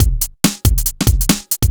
Breakbeat; Vengeance Samples; VEC2 Loops

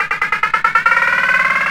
Loops Fills; Vengeance Samples

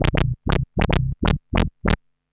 Arp D Line, ARP Odyssey; musicradar-synth-samples

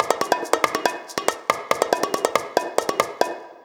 tribal loop, Dubstep; Dubstep Sample Pack

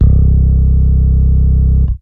Basses C; Vengeance Samples

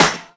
Snares & Claps; Vengeance Samples

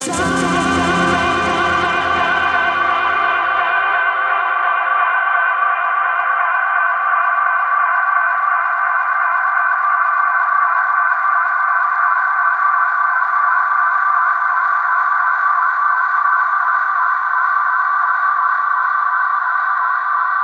Reverb Athmospheres, FX Vocals; Vengeance Samples

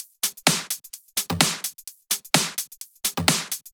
Drumloops 128BPM

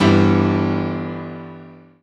Synths C; Vengeance Samples

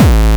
Bassdrums Hard Kicks; Vengeance Samples

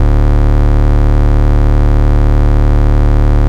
Bass D, Root D

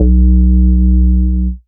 Root B, Ultimate Bass; Vengeance Samples

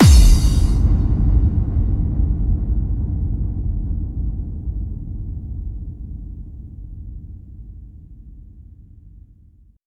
bassdrum reverb FX kick; Vengeance Samples

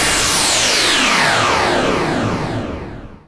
Downlifter

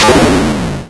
Arcade Riffs

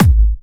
Hard Kick; Vengeance Samples

